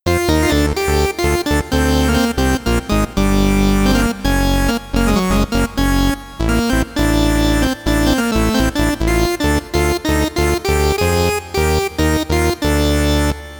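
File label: lead funny; the transmitter soundset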